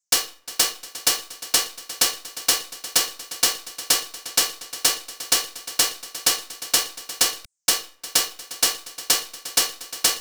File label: Techno Hihats